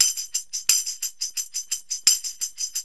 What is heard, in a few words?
tamb loop